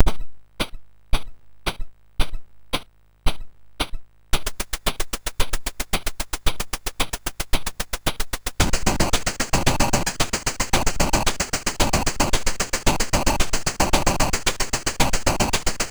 sid MOS6581R3; Break Fever

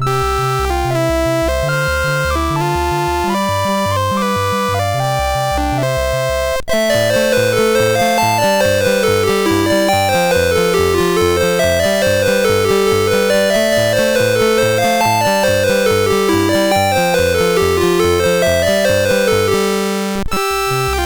BASIC sid MOS6581R3; Barbs Boat

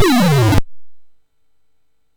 sid MOS6581R4; Frogger II-Three Deep

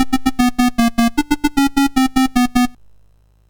sid MOS6581R2; Popeye

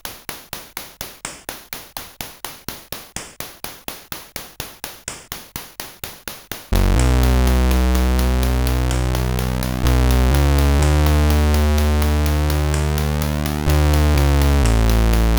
First Shower tune,sid CSG8580R5

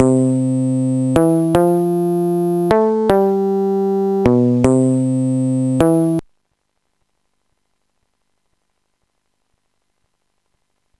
bass; Sonix Music Driver

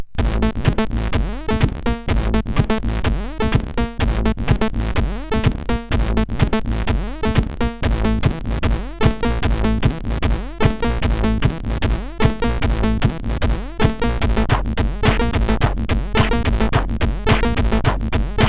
sid, MOS6581R4

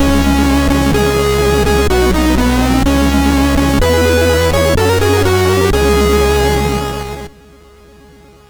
Supremacy Jingles

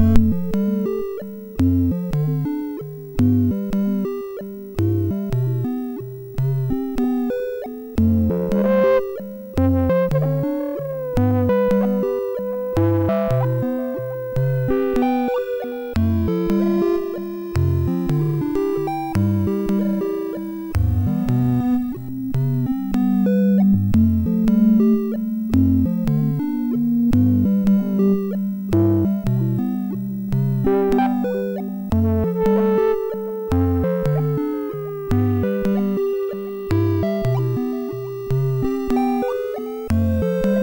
sid, MOS6581R4; Nyarla; Fairy Ring Preview

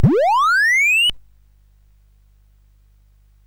Big Ben, CSG8580R5; GAMES

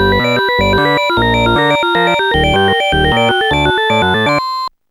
jazz four; Instant Music

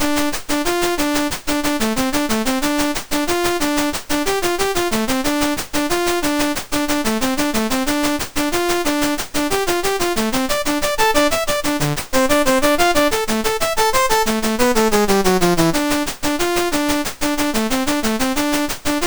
sid MOS6581R4; California Raisins and the Cereal Factory

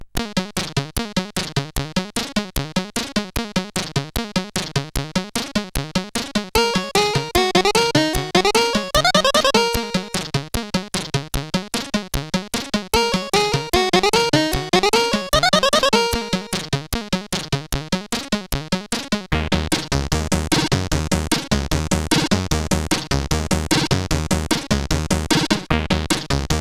sid CSG8580R5

